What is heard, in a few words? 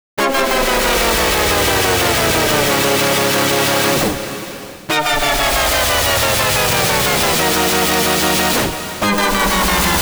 wind noise seq; the digital life